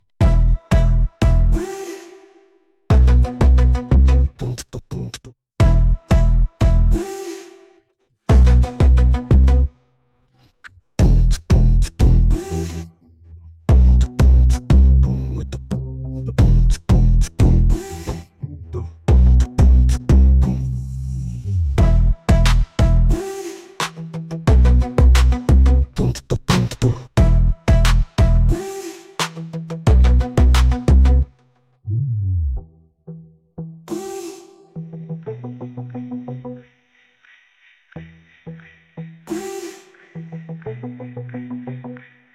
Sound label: Instrumental